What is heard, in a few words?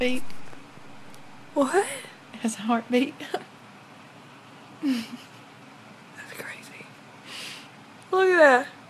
its a heartbeat